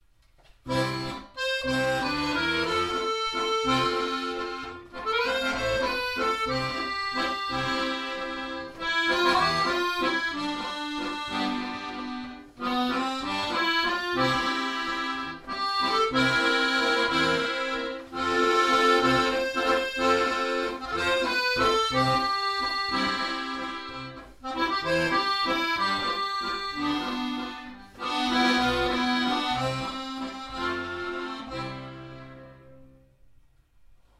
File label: Brilliant violin